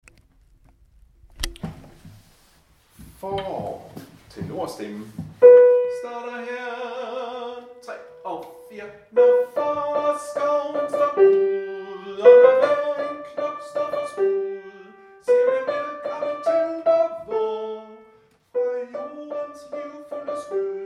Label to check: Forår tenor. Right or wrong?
Right